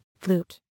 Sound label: flute